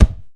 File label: Card Thud; MexicanaChillitime